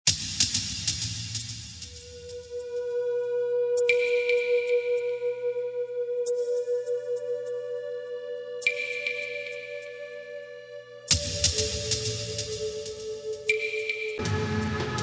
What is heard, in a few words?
Ambient